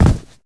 korotkij zvonkij zvuk vistrela salyuta

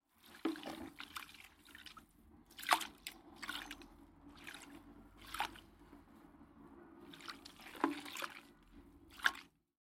chistka plastikovoj korobki vodoj

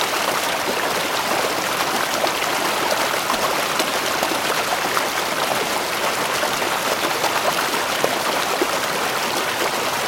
lesnoj vodopad proglyadivaetsya sredi derevev

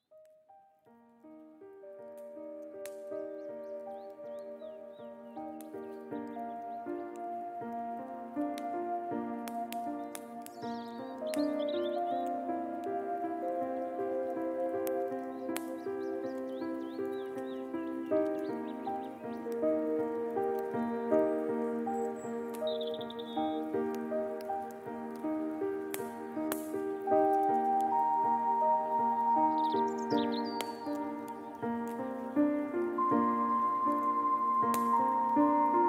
zvuki prirodi s melodiej

